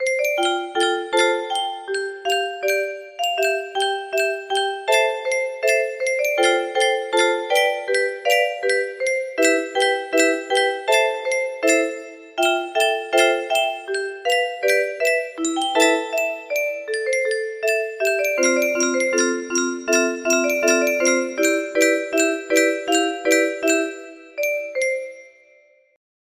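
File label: music box melody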